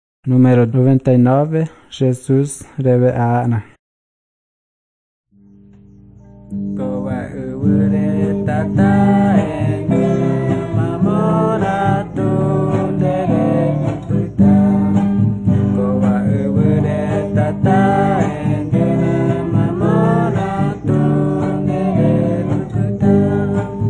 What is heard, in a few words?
songs guarani mbya; bible